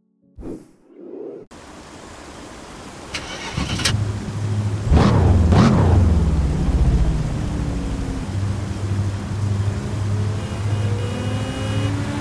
audi rs6 c5 s4 b6; Audi RS4; Audi RS4 Avant; Audi RS5

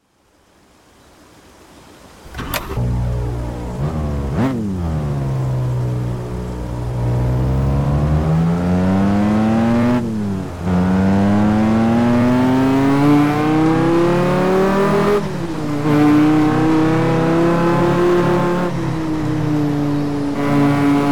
bmw m5 e60 m6 e63

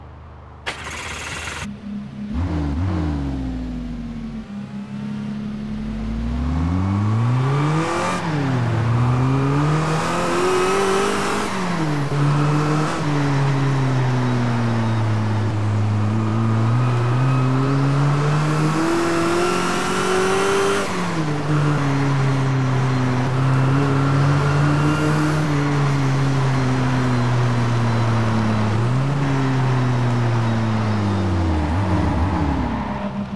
jaguar xj 220; TDU-1

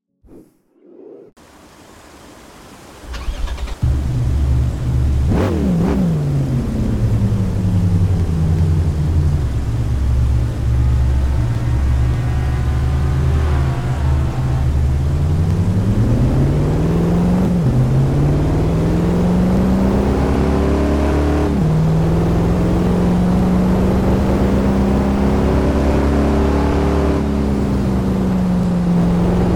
lexus is-f

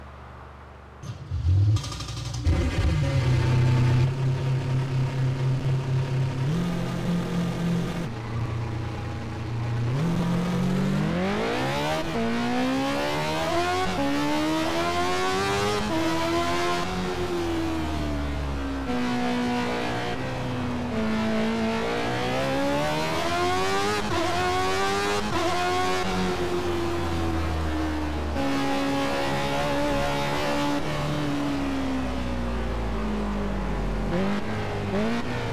mclaren mp4-25

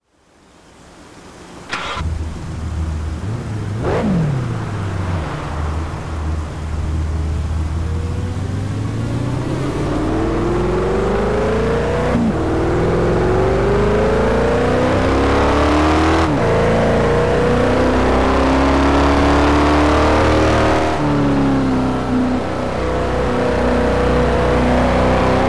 audi rs4-r8

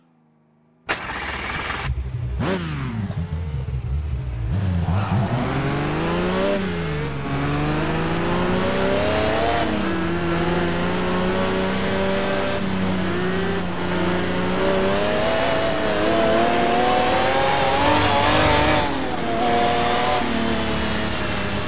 ferrari f40; TDU-1

